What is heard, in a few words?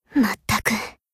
combo break